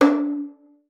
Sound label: FING D4, VIOLIN; Xsample VOL-5